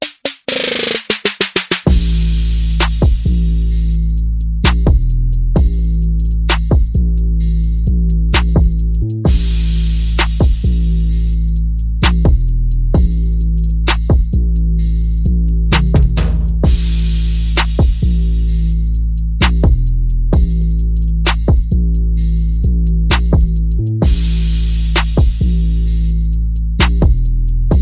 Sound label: Bass and Perc Only